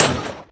hit, blaze; mob; Minecraft

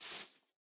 grass, step; Minecraft

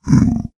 zombie pig; mob; Minecraft

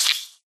hit, silverfish; mob; Minecraft

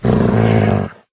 growl, wolf; mob; Minecraft

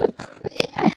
idle, endermen; mob; Minecraft

